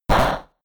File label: Hit Weak; attack moves; Pokemon; Gen 4; DPPL HG SS